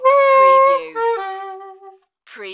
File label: Sax Smooth End